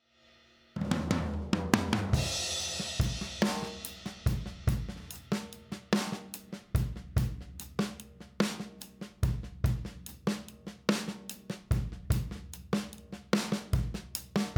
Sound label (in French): drums avec eq lifted